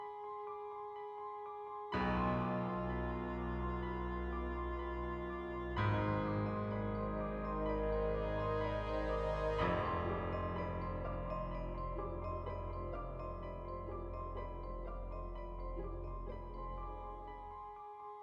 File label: Piano